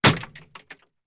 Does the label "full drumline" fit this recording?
No